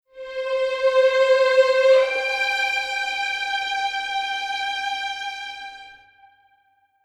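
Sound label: Strings Portamento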